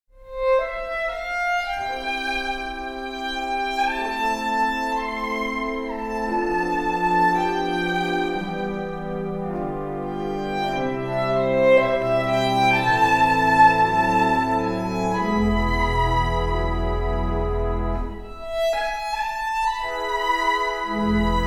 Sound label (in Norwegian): ved rondane fiolin